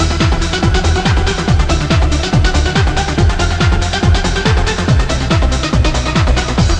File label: music